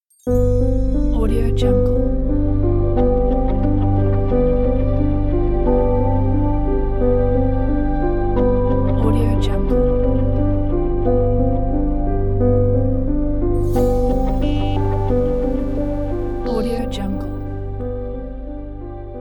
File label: space portal logo